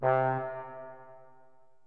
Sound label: mellow brass